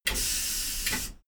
train intercity train hydraulic slide door hydraulics